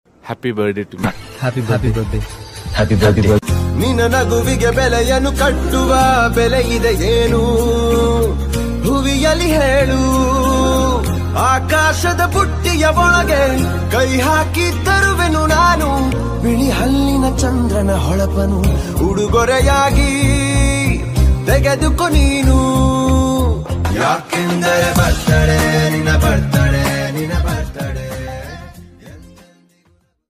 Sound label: kannada happy birthday song; muddupedducreation